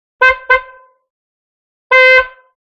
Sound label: Horn Morris 1000 Van H